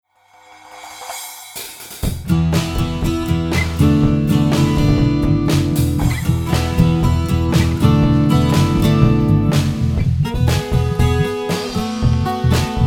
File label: Song Mix Sat Dry; Lewitt LCT 1040